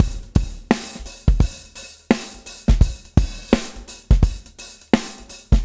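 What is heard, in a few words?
swing, swing shift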